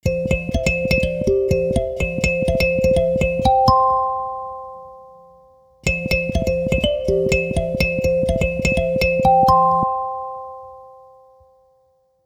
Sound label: Stereo Pure Tone, ringtones; Motorola Edge 70 Max China; Lenovo